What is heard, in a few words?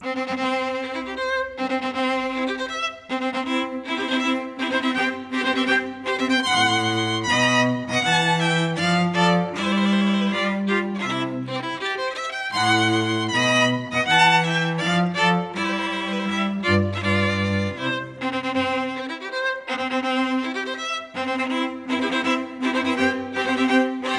Wedding March